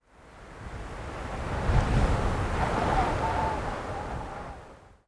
wind gust; Counter Strike Model Pack; CS:GO

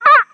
trop bird

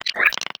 idle chirp, headcrab, creatures; headcrab classic; vox

